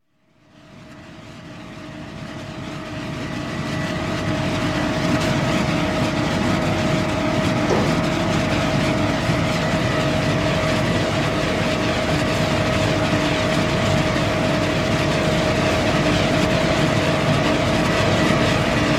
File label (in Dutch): Energie Windmolens; KMP Film Video Sound Effects